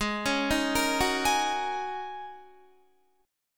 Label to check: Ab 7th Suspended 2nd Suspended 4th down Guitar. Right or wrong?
Right